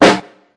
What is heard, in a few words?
SN, Dirty Hip Hop Kit; drums full kits; style kits